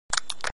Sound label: Suck; Lewd SFX